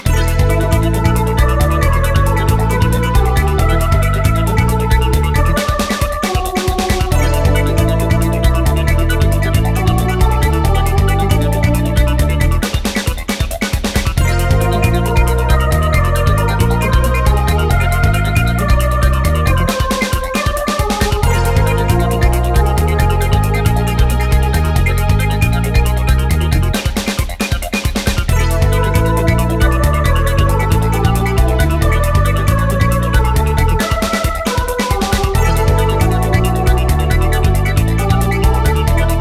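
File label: distortion guitar mix; Eve Zero; Busy Now...; Distortion GTR MIX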